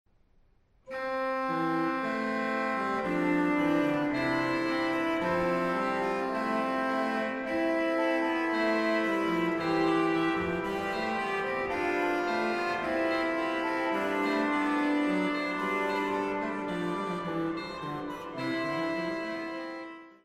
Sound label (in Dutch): Orgelvers a3 c.f. in de Tenor; Psalm 119